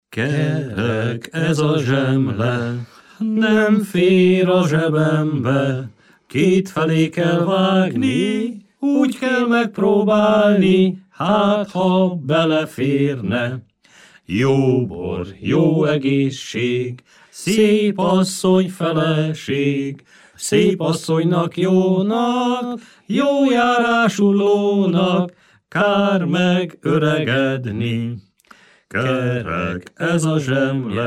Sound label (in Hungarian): nepdal; kerek ez a zsemle